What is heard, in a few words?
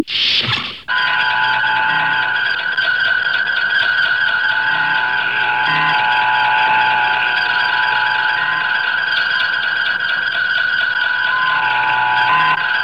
Doors Open Into Bridge; Enterprise; Star Trek Original T. V. Series Sound Effects